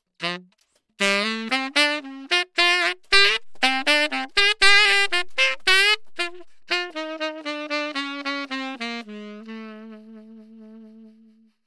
saxophone, fet; lewitt

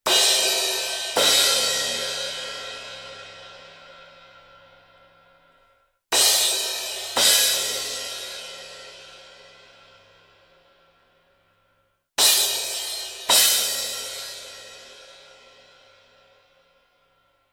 cymbals, fet; lewitt

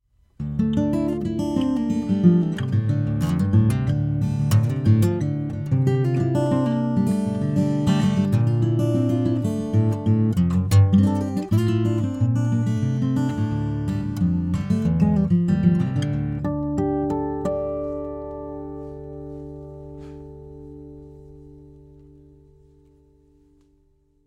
acoustic guitar lct340; lewitt

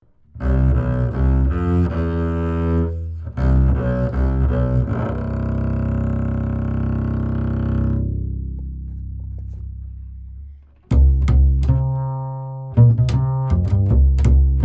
upright bass, fet; lewitt

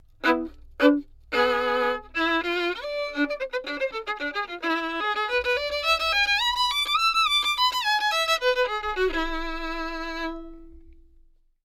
violin mtp440 dm; lewitt

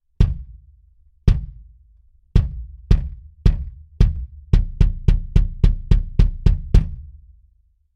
bass drum dtp340 rex flat; lewitt

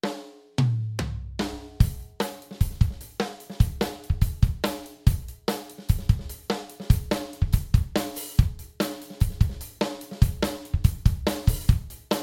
drum performance beat kit pro 7; lewitt; dtp

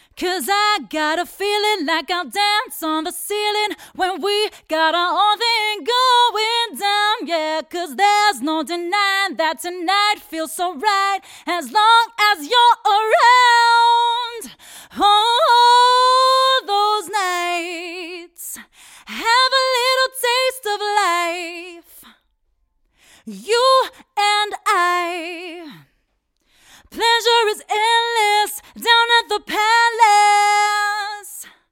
female vocals, pure; lewitt; lct440

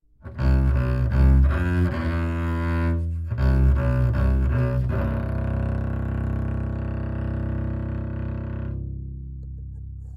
upright bass, dual; lewitt; dtp640 rex dyncon

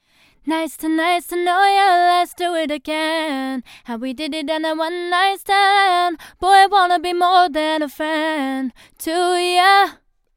female vocals, tube; lewitt

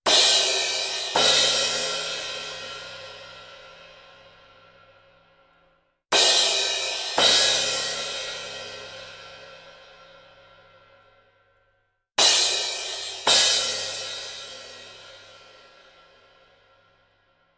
cymbals, tube; lewitt

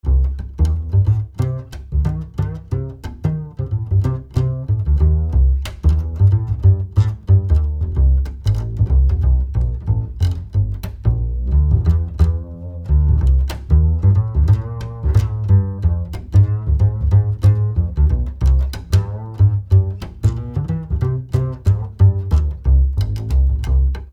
lct 640 ts upright bass eight; lewitt

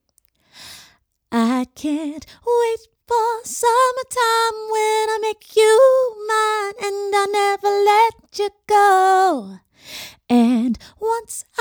female vocals, eight; lct 441 flex; lewitt

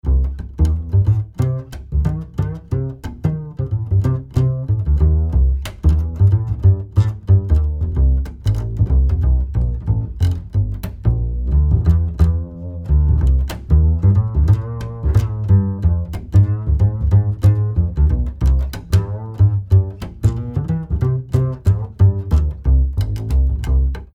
upright bass, cardioid; lct 640 ts; lewitt

